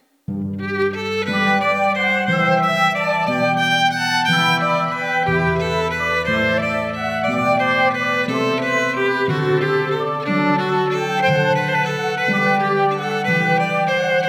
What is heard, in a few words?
violin